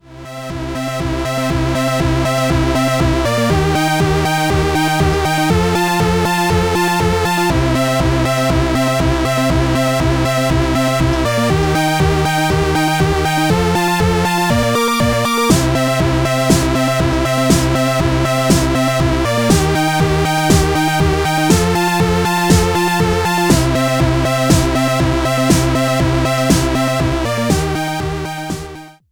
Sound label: Novation Circuit Mono Station; Factory Session